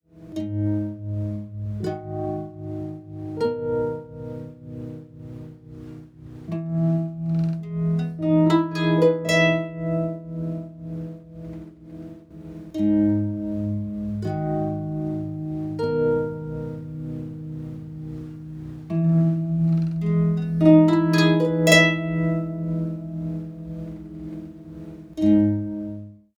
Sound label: Tremolo